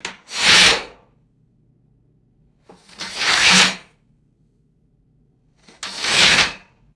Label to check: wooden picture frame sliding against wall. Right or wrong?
Right